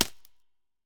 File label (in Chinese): 嘎枝冻结声